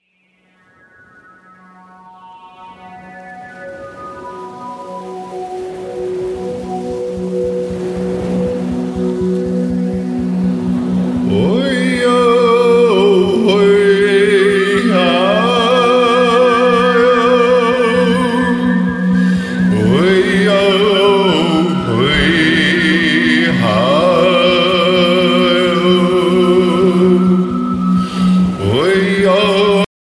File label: Spirit Winds